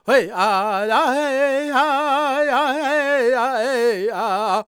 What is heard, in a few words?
C# MIN, World Voices, LAKOTA